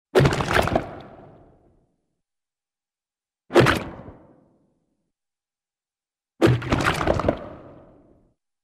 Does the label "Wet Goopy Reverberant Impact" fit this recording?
Yes